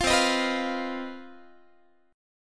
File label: C Minor Major 7th Double Flat 5th C down Guitar 4A 4E 5A 5D 5G sharp; CmM7bb5; strummed